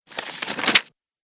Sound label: Turning Paper Pages; Paper Sound Effects; office